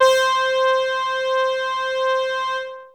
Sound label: Techno leads, hits and riffs